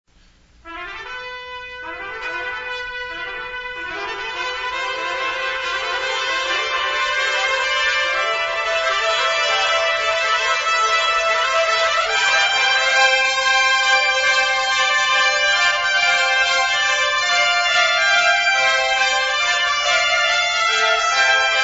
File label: tpt fanfare